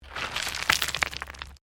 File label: STONES MOVE; Material components for constructing more complex sounds; Rocks; Materials